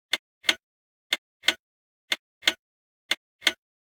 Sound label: clock tick; DeadFright